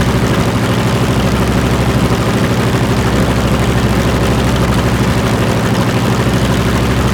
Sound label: plane; World of Tanks